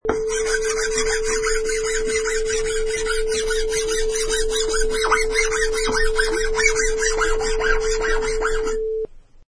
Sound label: Wood File